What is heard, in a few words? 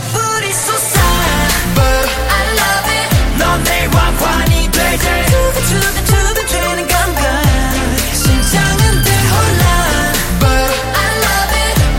Chorus; Crown